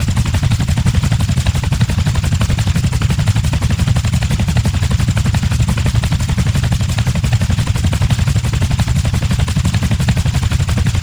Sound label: helicopter rotors close; Rust